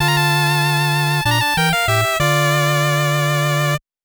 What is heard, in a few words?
gameover; panicpumpkin